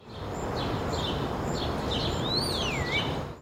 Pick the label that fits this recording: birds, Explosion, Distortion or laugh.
birds